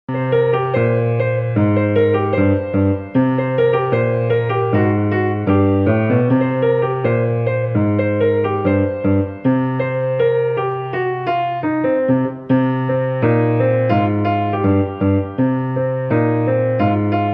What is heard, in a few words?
Walking Jazz